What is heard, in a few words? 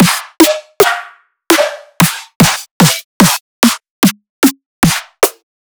Drum Hits